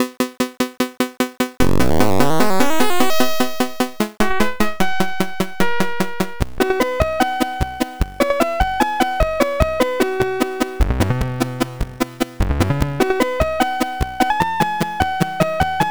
c64 title; elite

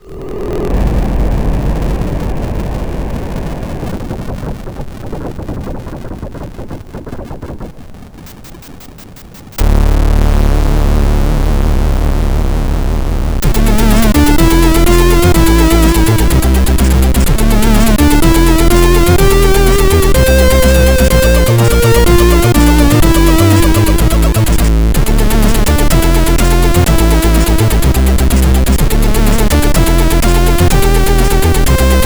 c64 game end; turrican

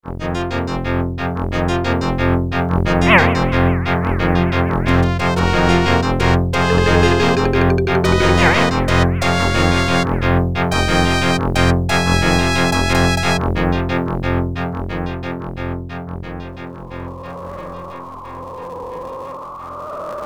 arcade title screen; gauntlet

